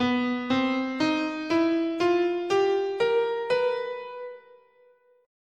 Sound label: B Persian, scale